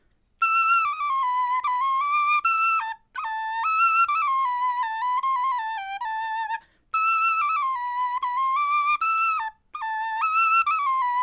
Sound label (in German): melodie, knochenfloeten